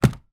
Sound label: household vacuum cleaner bosch pro animal hose drop on carpet